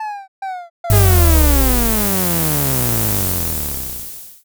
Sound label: pressure drop